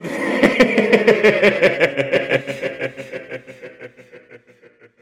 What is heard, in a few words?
powerup dud